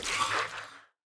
snakeboss, monster

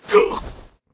dark priest damage

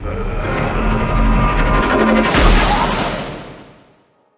lich attack, monster

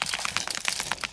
beetle move, monster

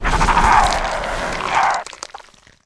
sandkid death, monster

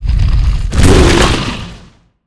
golem; monster; boss

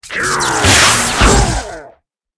dark knight attack, monster

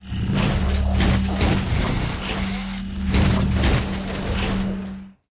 idle, monster

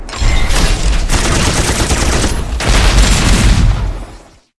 robotboss, monster

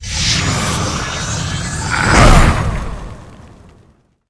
vampire attack, monster

